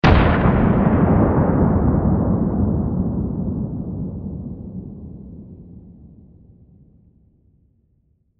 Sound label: Explosion Dynamite Demolition Blast Distant; Military; Warfare